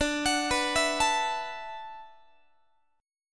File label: D 6th Add 9th D, Guitar; D6add9 arpeggiated; JGuitar